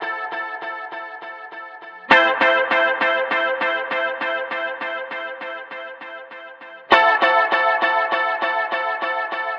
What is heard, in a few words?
A Gtr Dub Accents; Zero-G; Future Wave